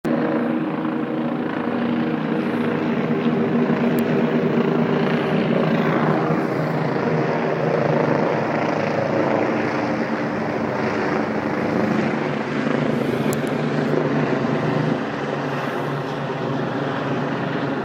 helicopters over east london

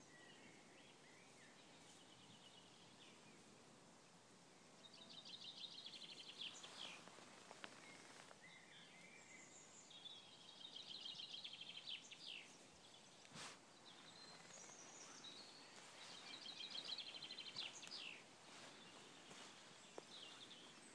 peace and quiet and birdsong

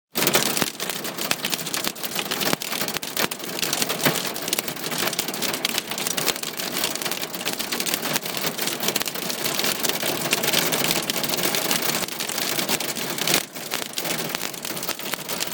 summer hailstorm

